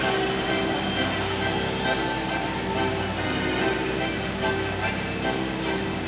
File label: ORGAN